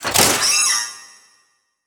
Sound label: Spear; Yu-Gi-Oh! Master Duel